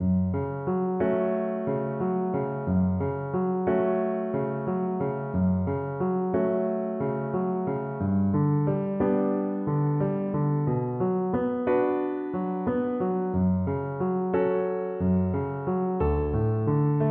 northern lights, Viola

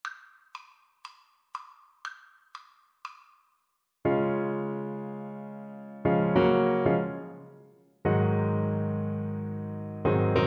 Baritone Saxophone

